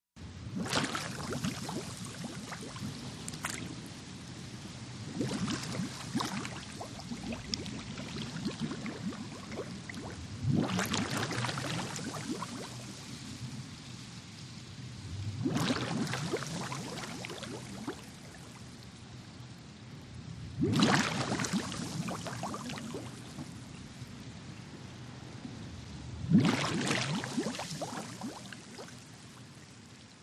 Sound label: Scuba Regulator Bubb